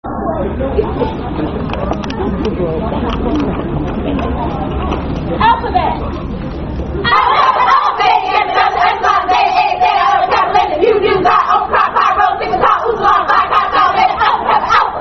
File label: Greek Alphabet Chanted; Alpha Kappa Alpha